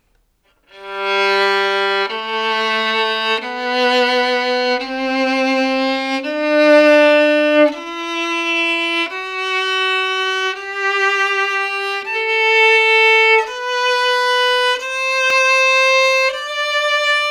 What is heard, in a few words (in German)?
Werkstatt Tonleiter, Geigenbau; Violins; Werkstatt 2023